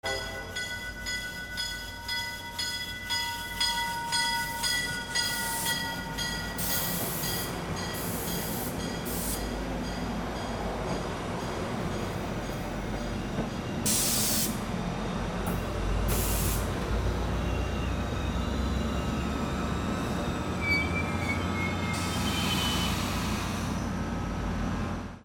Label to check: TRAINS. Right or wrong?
Right